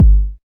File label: smooth steel kick drum sound g key